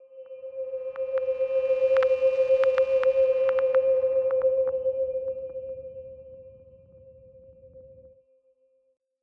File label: aetheric solo pad